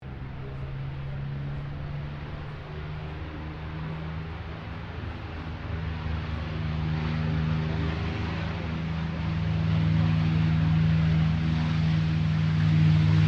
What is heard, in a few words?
AIRCRAFT CAC CA-18 MUSTANG MK23 TAKEOFF; CA-18 /P-51 Mustang; Airplanes; Vehicles